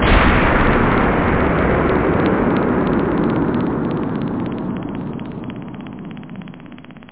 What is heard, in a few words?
SLAP; da capo vol1